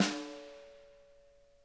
RIM, snare; Sonic Reality; Interactive Drum Kits

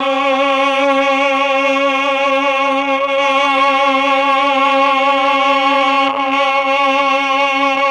CHOR; ILIO; Propeller Island Legendary M400

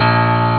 bass piano; monk; ST-XX Amiga Soundtracker Sample Packs

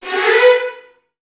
VN FX, Virtuoso Strings

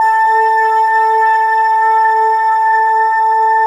Single Note, Keyboards

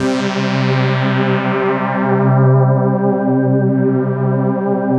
SYN K-2000, Roland; Sound & Vision - Gigapack I CD 2

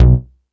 Hip House, Drum Machines E-MU Pro-Cussion

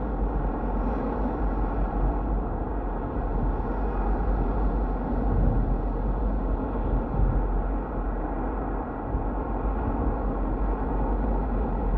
Ambience Loop, outdoor

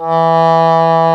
English horn E2; InVision Interactive; Lightware VOL-1; Instruments & Percussions

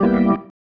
Stab One Shots, Organ

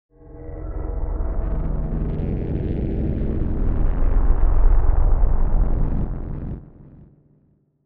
Dark Synth, cinematic drama soundbeds; musicradar

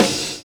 END ROLL; Univers Sons

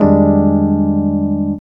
CHORD, 150 BPM; Ueberschall; Drum & Bass Flash Trackz

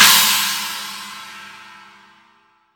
CHINA, Drum Dry; East Collexion; Drum 1 Dry

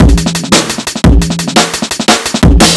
E Beat, Electronic Beats

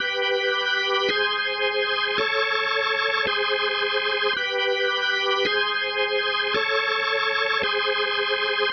Psychedelic, Organ, Loops

